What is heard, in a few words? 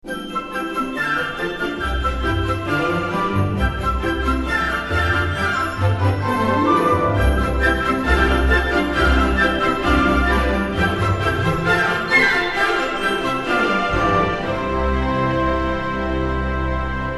Relaxing In Castle